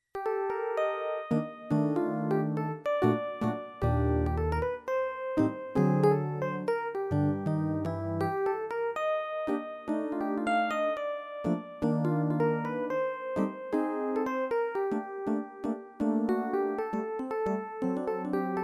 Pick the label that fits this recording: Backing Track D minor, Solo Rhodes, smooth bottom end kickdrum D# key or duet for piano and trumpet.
Solo Rhodes